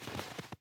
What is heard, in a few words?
snow step